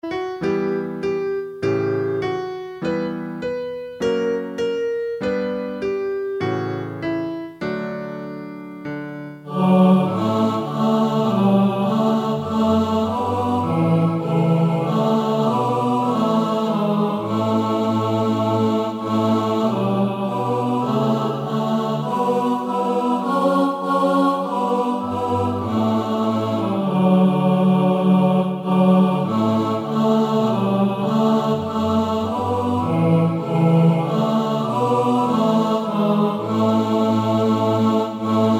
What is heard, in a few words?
TENOR